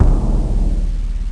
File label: thud